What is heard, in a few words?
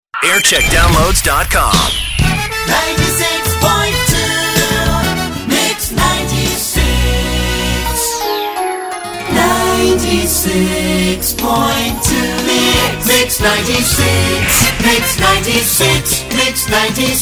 jingle montage; mix 96